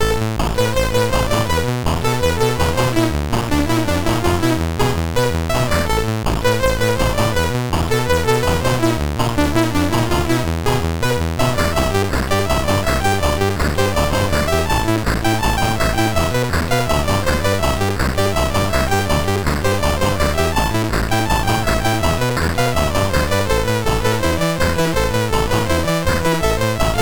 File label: Music BASIC, sid MOS6581R3